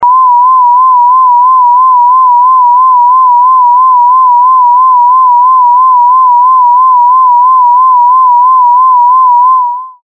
Vibrato

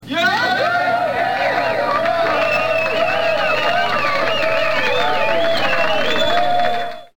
clap

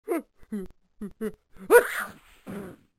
sneeze

